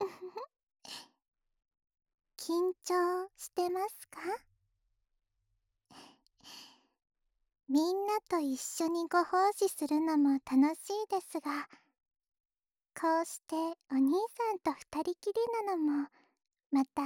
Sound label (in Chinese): 即兴掏耳（右耳）, ASMR